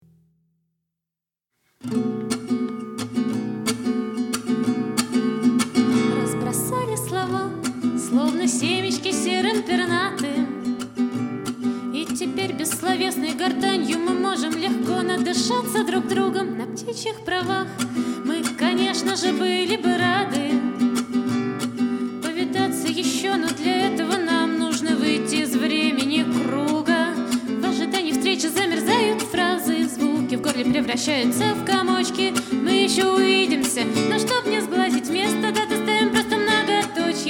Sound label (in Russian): Прощальная босса-нова